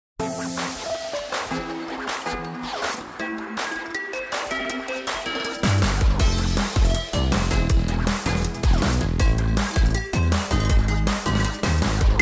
Battle Kpop; Digicalized; Android

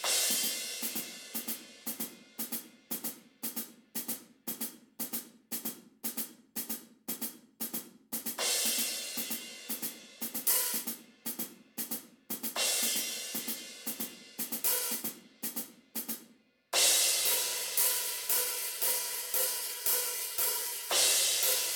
Instrumental Cymbals; nowhere station

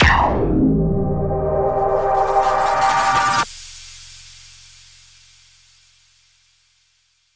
Gate Enter

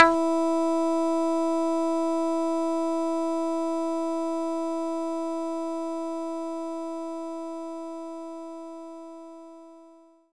Muted Guitar E4; knights try

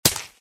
Stab Knife; Friday the 13th: Killer Puzzle

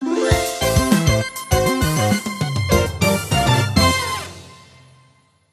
Jingle - Psychedelic

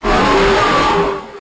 Boomburst; pokemon reborn e19 2022

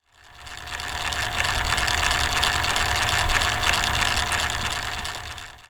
SFX gear churner; a dump in the dark

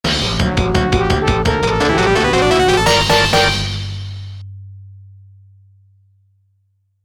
GAME OVER (Internal Ver.); Phalanx

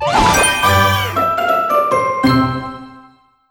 Crunchy Finish

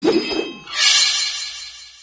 opening battle sound; Caravan Beast

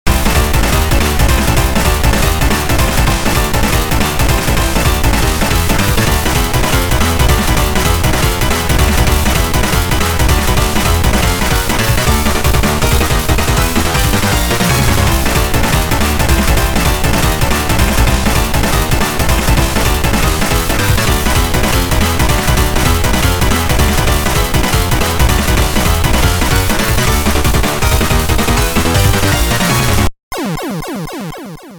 Scream Out; Eschatos; N163 arrangement project

